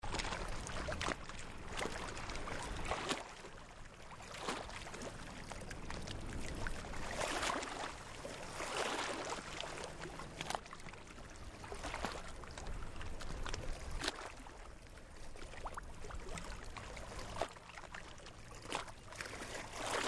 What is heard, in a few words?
water; eskimo